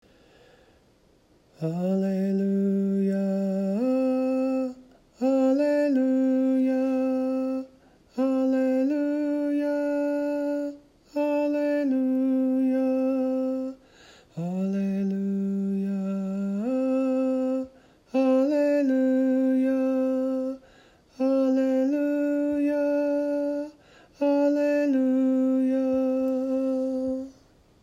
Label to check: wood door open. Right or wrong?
Wrong